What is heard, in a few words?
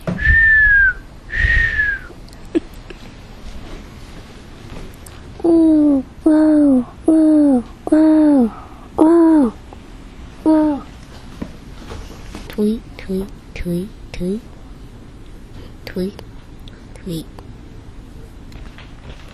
bird sounds